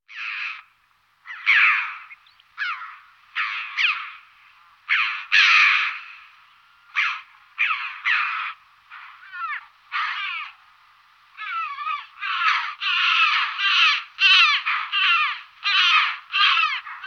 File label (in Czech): Kavce cervenozobe Pyrrhocorax pyrrhocorax